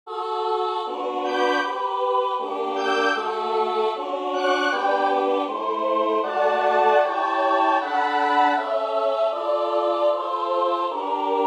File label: Small Prelude